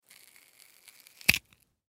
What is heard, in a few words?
Potato Break, Potato Break In Half; Food; Household; foley; Blastwave